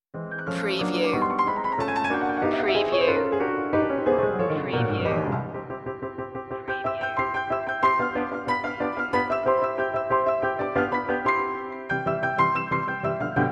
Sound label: piano scherzando; classic piano